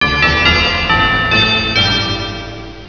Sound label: bells